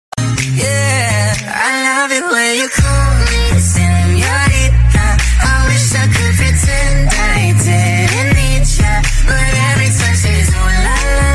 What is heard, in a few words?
Mobile Ringtone